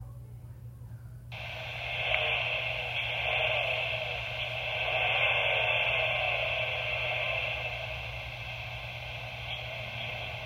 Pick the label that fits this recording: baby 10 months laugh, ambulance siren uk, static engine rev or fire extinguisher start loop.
static engine rev